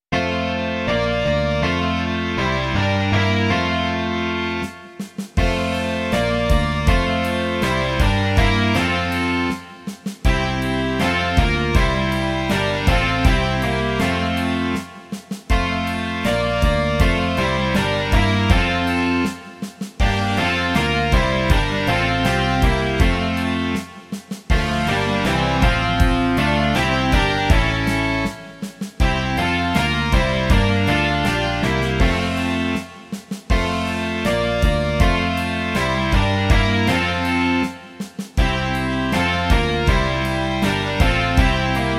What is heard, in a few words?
rock